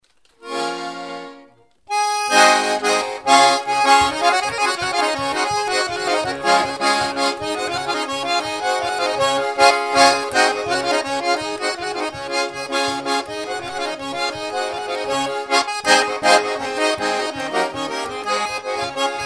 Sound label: polka; Tuliatitsy